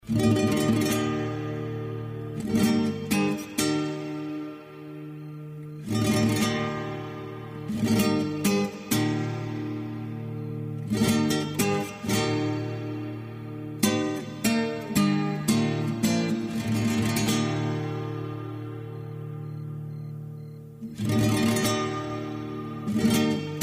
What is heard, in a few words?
Messiah Guitar Version; Handel